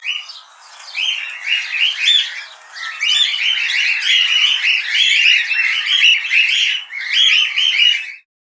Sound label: cockatiel short